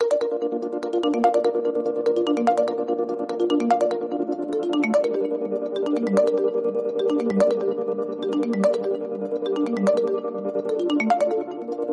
soft waking, ringtone

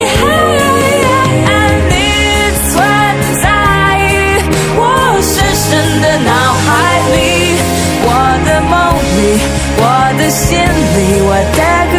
chinese ringtone

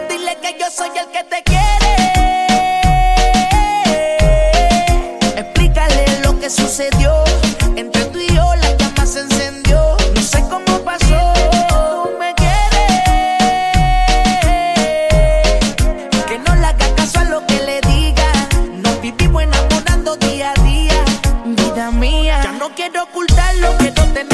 latin jam ringtone

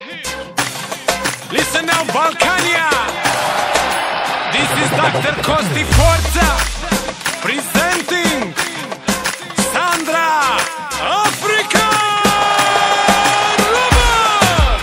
cool croatian ringtone

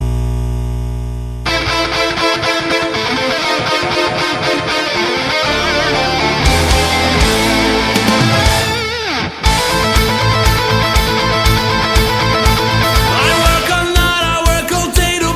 new guitar; ringtone

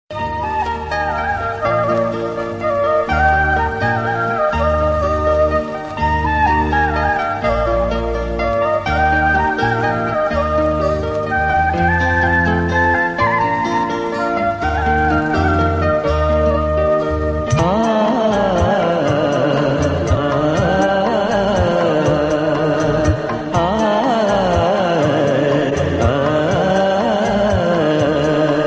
malayalam romantic

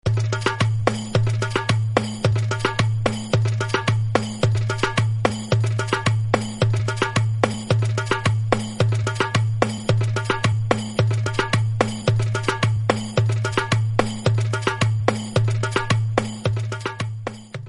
african trommel; ringtone

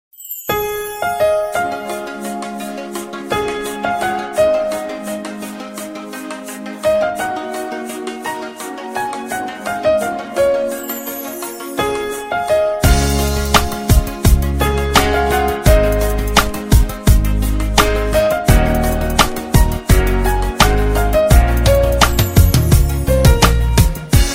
romanian piano; ringtone

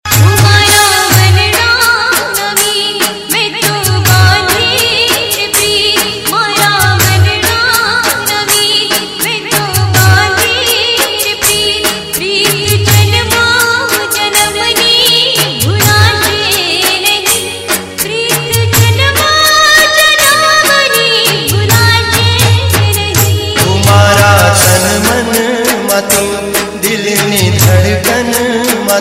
gujarati garba ringtone

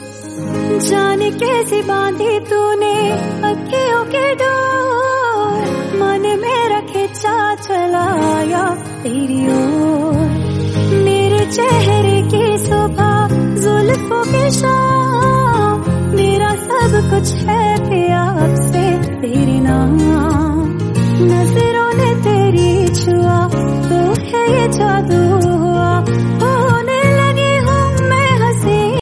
female, ringtone

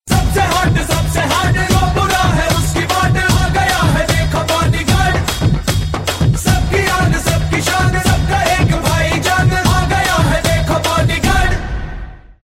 hindi song; bodyguard 2011

